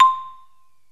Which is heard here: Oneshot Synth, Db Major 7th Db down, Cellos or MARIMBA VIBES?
MARIMBA VIBES